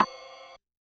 Rhythm SideStick Ride, Realistic Concertmate-670 drum machine